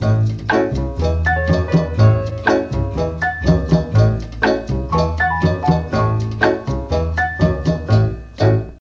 E2 CHA FLT, mellotron

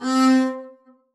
arco c4 ff down, double bass

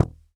noise muted slap, swag bass

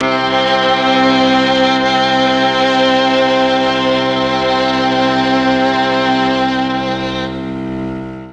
C2 MIXED STR, mellotron; MIXED STRGS

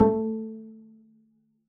pizz a3, double bass

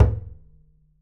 perc, pizz; meatbass

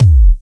bd sone, kick; drums; one-shots

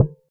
F1 release, Pianet T; e pianos

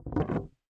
noise muted strum, swag bass

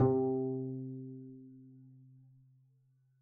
pizz c3, double bass

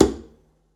pizz noise click, double bass

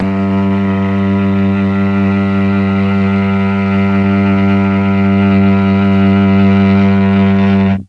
G2 mellotron cello

